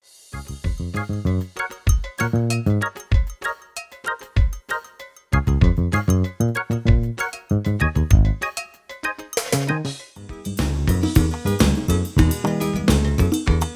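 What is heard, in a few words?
Totaal Latin, music on hold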